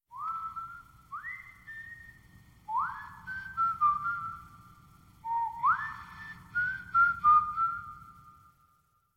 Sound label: (Whistling)